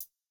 hihat; Reference Beat BPM120; j city pop 1980

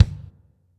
Rock kick; Reference Beat

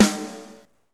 snare, snare house

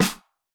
Snare; Reference beat bpm95; urban grooves; Rock

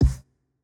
Jrock kick

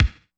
kick; pasific; Pop

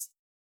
chillhouse hihat

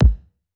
Modern kick, Electro

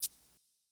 Jazz hop shaker; Hiphop

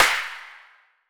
hiphop snare, Effect on

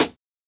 Jazz hop snare

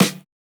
Hiphop snare; Dry original

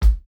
kick, house

